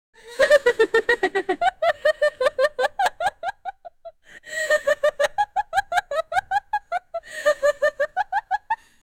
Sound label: villain laughing